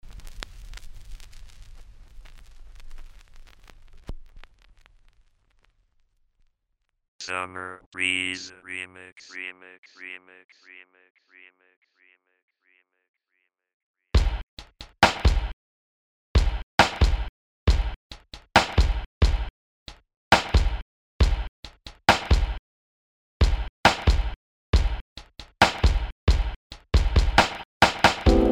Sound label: downtempo remix